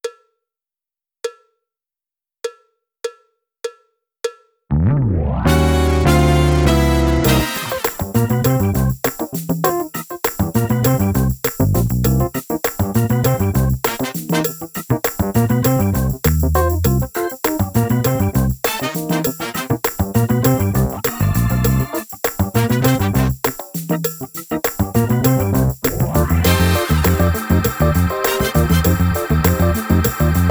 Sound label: That Real Funk drumless